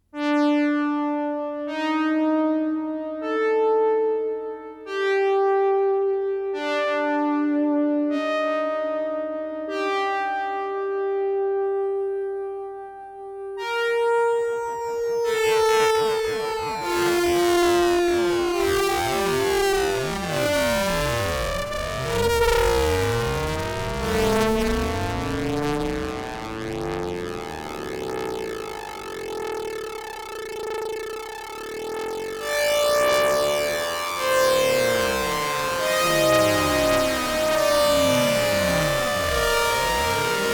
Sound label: A110 Oberheim OBSX